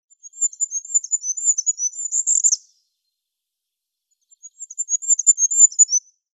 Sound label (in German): Wintergoldhaehnchen Regulus regulus; Vogelstimmen